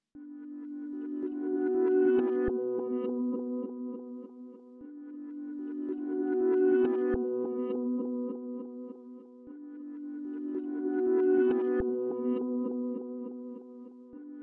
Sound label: 103 BPM D Maj Guitar, loop stems; Melody; Cymatics; 2022 Melody Collection Platinum Expansion; Needed You